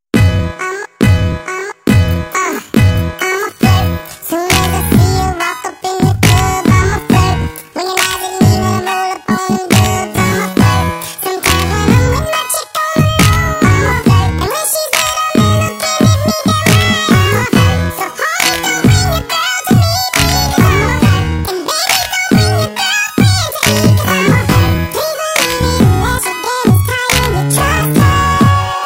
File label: Chipmunks